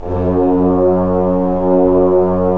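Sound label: brass trombones; Roland LA Composer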